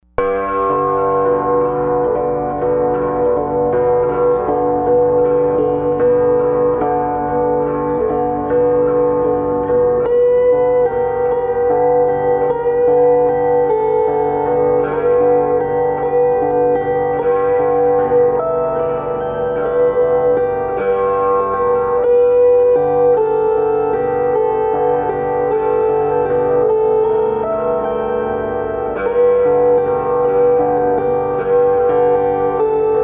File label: Blues at the Station, harp